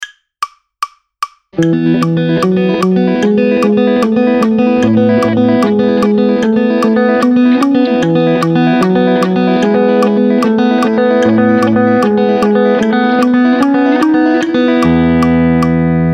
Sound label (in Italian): Hybrid picking cantini a vuoto